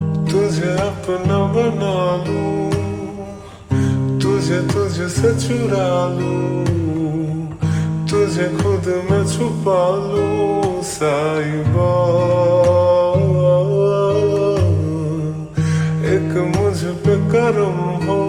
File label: Ringtone Slowed Reverb